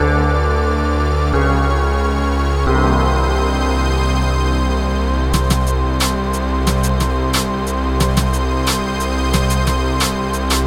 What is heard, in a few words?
Ambient Loser; Vampire Legends: Power of Three